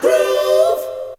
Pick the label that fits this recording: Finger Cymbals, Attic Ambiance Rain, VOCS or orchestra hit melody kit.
VOCS